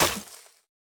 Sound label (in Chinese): 可疑的沙子破坏声